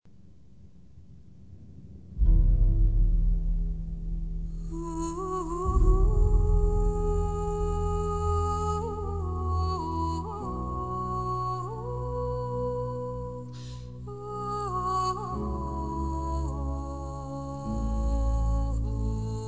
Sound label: solo violin; musica de peliculas